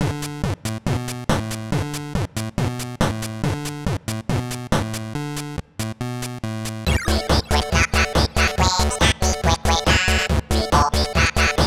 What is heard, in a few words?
NES Remix